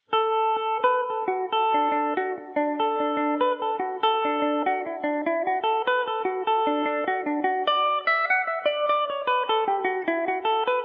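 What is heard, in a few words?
jig D major revelation, irish mandolin; custom house jig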